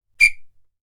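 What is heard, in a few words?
referee whistle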